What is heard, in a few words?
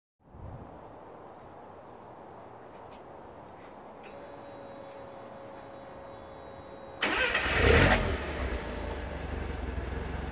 exhaust open hood; 1st generation 1979-1985; roof; choice exhaust components